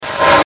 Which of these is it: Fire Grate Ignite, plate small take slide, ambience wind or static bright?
static bright